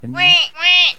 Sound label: quack